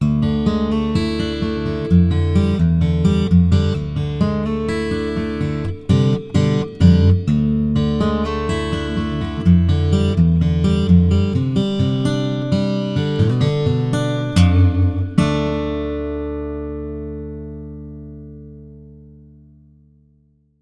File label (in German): Fingers hall, Westerngitarre mit Tonabnehmer; Reverb; FGC-TA; C-TA VT Vintage Tint Transacoustic